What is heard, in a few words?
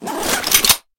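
zipper closing of weapon bag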